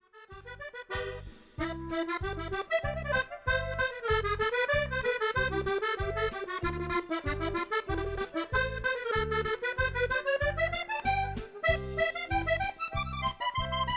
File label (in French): polka